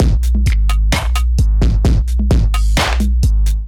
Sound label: Kit Melodic 130 bpm, Drums; MIDI Clips; Buttermilk; Ableton; Chop and Swing